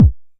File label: 909 BD; Goldbaby Tape808 & Tape909; The Tape 909